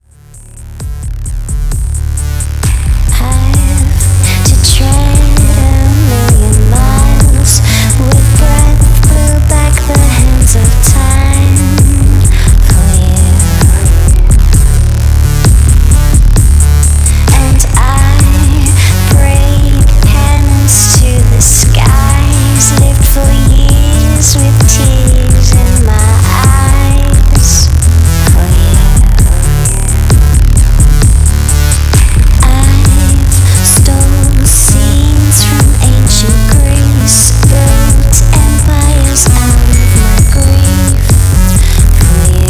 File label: Pop Music Track